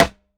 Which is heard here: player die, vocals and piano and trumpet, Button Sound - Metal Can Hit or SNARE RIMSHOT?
SNARE RIMSHOT